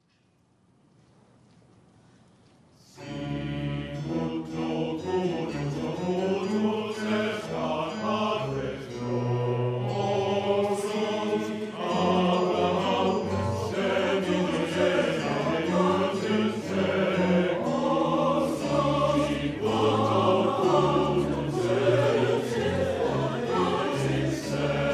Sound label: Coro